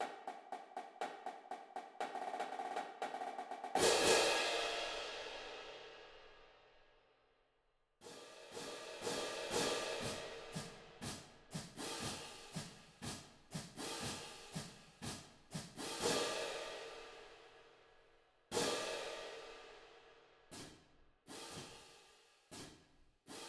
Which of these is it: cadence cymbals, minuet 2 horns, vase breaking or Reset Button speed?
cadence cymbals